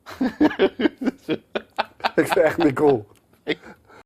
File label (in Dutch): lach